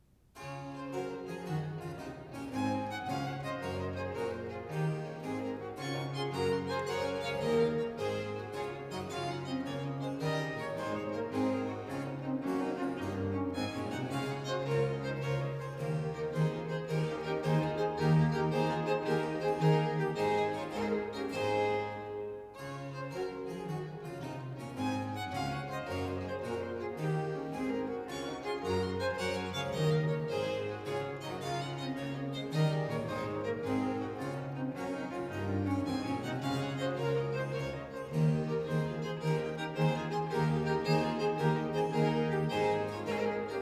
Canon and gigue, for 3 violins & continuo in D major, Gigue